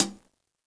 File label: hihat - closed; JazzFunkKit